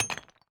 sel stone

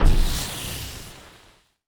onager hit siege

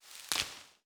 gather fruit

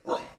dog move

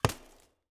lumber tree

